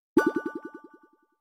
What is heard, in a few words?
get coin; fruit master